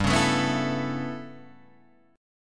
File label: A 9th G, Guitar Standard; 3,4,7,4,5,5